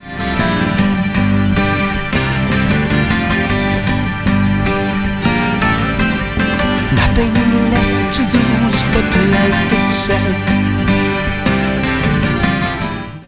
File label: acoustic bass guitar